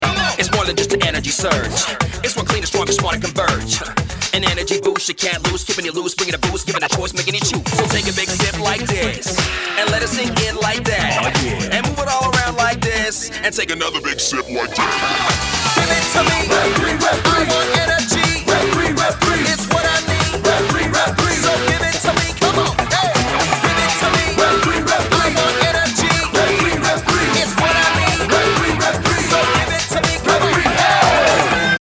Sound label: ringtone